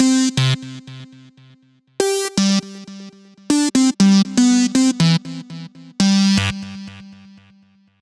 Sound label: Air Lead